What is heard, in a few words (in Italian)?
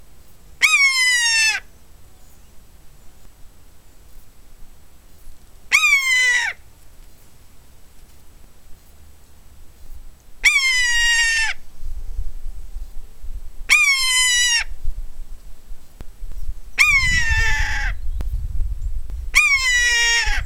verso poiana comune buteo buteo common buzzard rato nero